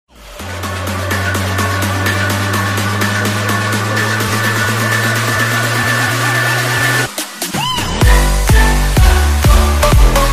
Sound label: trance ringtone; tomorrowland belgium